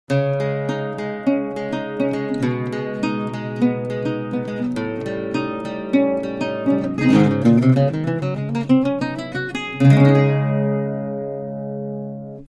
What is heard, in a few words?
LAUD NYLON